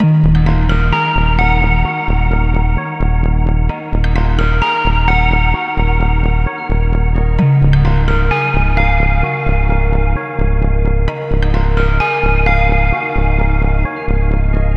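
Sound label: synth loop introspect 130 D# minor; Virtual Riot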